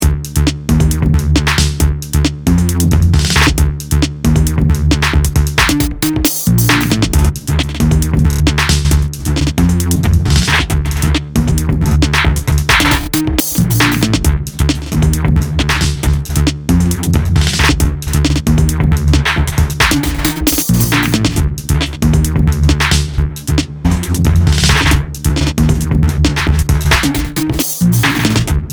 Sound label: Glitch Box; Doofers; Thebellows